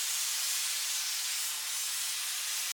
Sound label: Panel O2 Airloop; Among Us